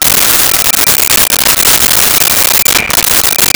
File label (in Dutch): servies, home-made